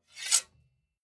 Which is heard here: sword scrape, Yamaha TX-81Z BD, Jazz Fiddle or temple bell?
sword scrape